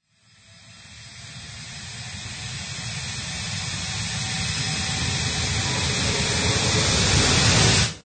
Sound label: Epic Movie Trailer Aircraft Riser